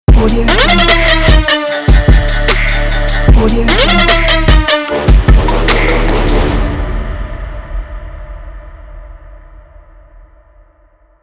punchy trap logo

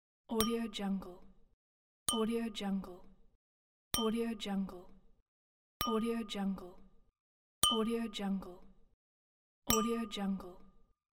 glass clink

